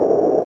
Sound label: evil druid move